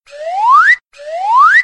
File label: Slide Whistle Sounds Car Horn